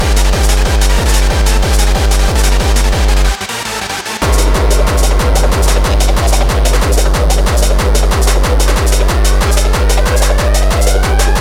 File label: roar